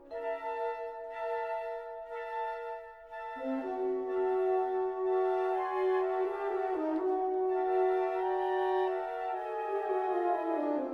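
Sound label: Wind Ensemble; Colors Contours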